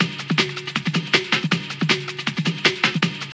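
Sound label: drum loop; X-Static Goldmine 3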